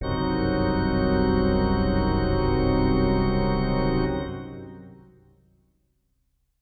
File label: Heavy Pipes C, heavy pipe organ; SYNTH KEYS